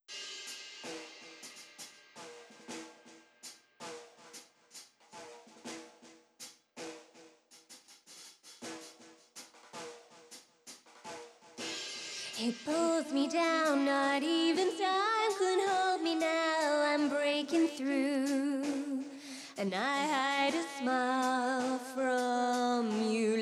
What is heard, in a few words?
Mix Delay Size